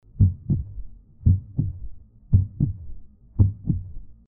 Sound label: Heartbeat; bauhaus; generative; punkt